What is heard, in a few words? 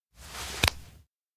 pray hands two; anemoi